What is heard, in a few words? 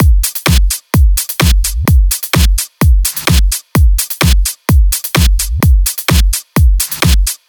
128BPM Drums; Vengeance Samples; Verve Kit